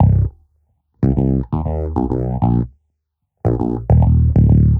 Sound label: Bassline 100 C, hiphop, 100bpm, Bass; musicradar